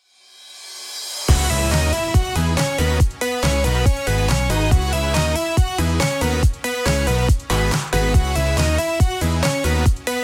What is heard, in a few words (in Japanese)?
明るくかわいいEDM系BGMです